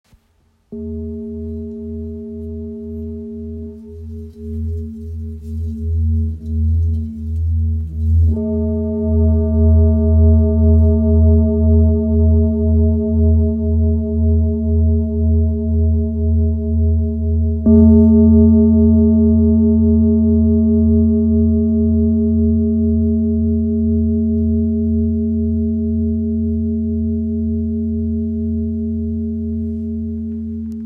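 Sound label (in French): Bol ramgandi mi, bol chantant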